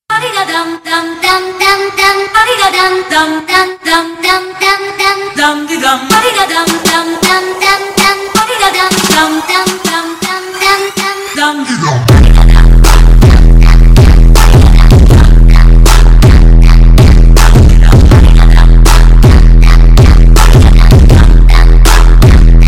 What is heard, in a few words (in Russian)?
zhestkiy dapstep